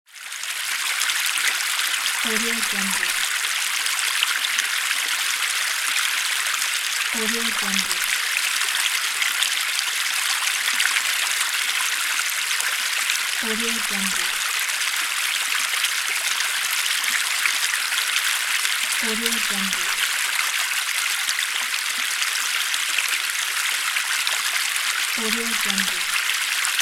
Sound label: fountain